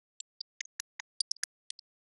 denoising glock 10dB mix

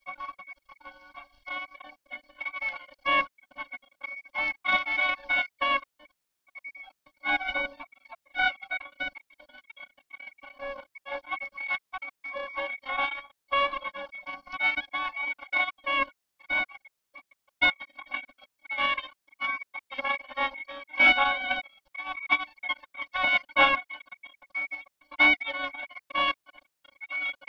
Jazz

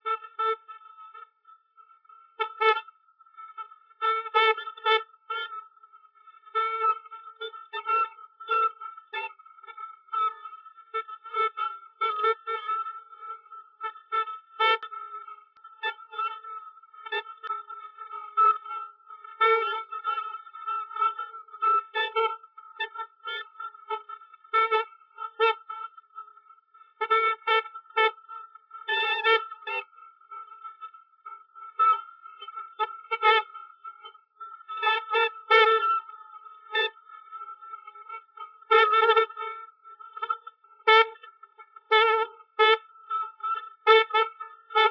Jazz

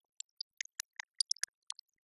denoising glock 0dB mmse

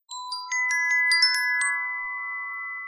denoising glock 0dB mix

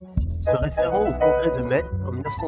dual music

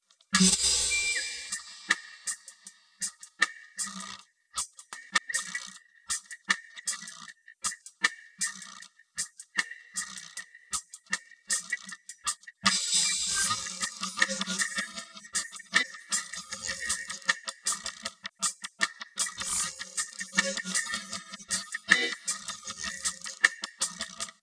drums

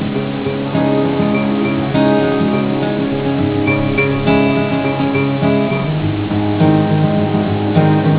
musical

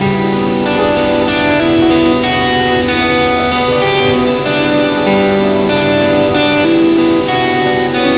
musical

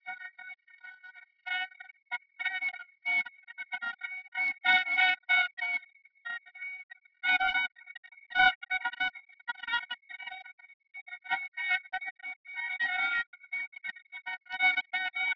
Jazz

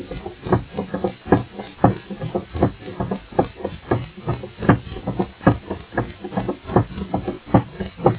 percussion

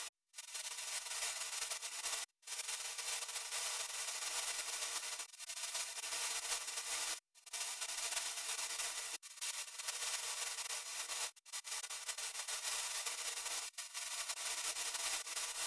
piano NMF

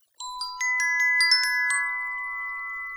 denoising glock 0dB mmse

